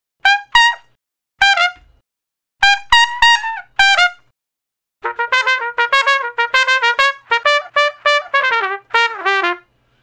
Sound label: Trumpet Straight (G); Solos